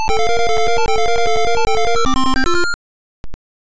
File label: Round Clear; Flicky; Sharp X1